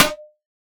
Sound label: Bell Reso Noise; One Shots; Drums; Ableton Live 12 Lite; Core Library